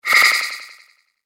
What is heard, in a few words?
Spell Of Twilight, magic sound effect; fantasy sound effect